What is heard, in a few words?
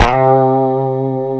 C 3, guitars; COMPR STRAT; Spectrasonics; Hans Zimmer Guitars VOL-1